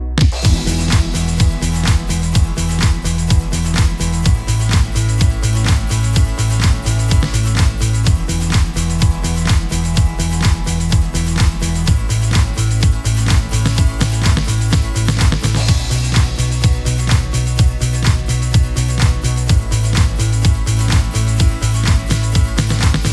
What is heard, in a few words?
instrumental transient boost